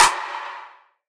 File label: large room clap single shot f sharp key; single hits one shots